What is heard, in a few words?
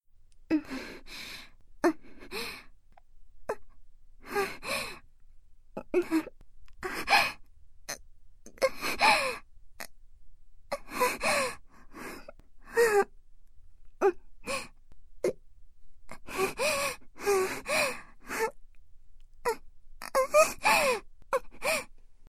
moan; oppaigames